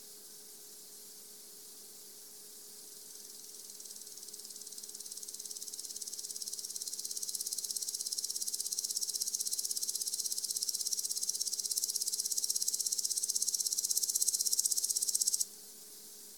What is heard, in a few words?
omo rufi, Omocestus rufipes